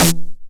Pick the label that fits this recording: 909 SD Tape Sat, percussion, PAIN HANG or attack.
909 SD Tape Sat